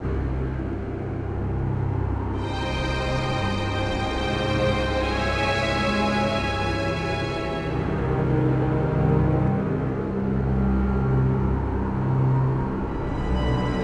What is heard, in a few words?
Menu; X-Men Legends II: Rise of Apocalypse; PlayStation Portable; PSP